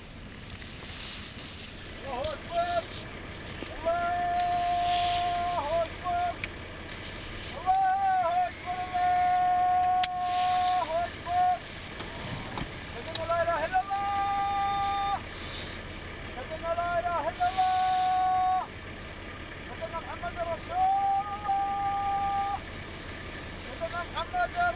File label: saz; サウジ・アラビア２